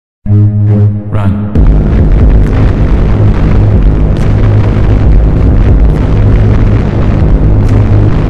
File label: reverb